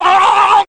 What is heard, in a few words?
pain; ash